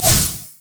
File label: fracture consume; LoL; skarner